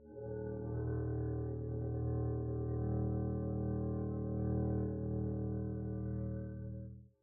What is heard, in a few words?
arco eb1 pp up, double bass; dsmolken